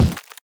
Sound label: dung ball death